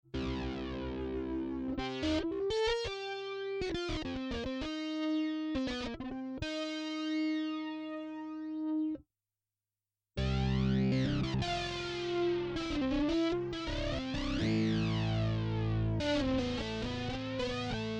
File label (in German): hohner pianet t reverb git effekt